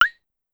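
Claves Up Fast, Drum Machines Clavia DDrum-AT Pitch Envelope; Pitch Env Various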